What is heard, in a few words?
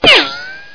gun hit